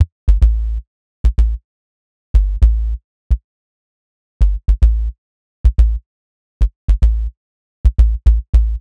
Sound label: HipHop Synthbass Emin, Hip Hop Bass Synthbass loop; Digidesign; Pro Tools Essential Loops